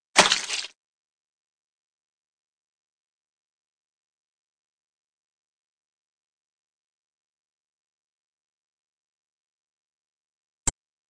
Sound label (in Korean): Fights, 싸움-전투; 헐리웃특수효과음